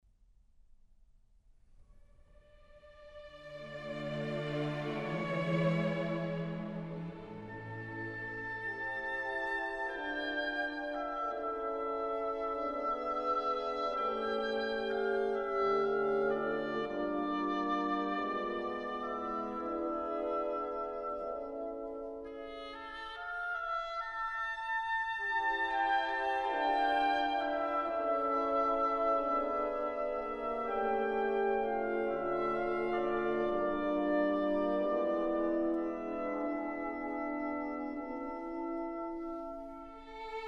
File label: Piano Concerto No. 3 in D minor; Rachmaninov